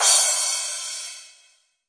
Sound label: Crash classic, Electro Soul Kit; STYLE KITS (Hip Hop, Etc)